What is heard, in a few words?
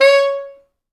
C#4, Pop Brass; Best Service ProSamples vol.19; AKAI